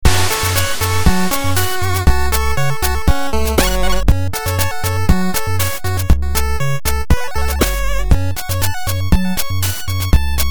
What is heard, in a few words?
song